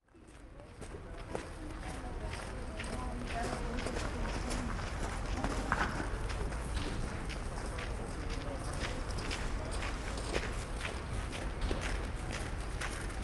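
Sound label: winter snow pedestrian street people walking and talking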